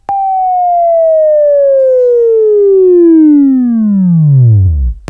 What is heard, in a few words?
Buzz; Labview-Program; Sweeping Training program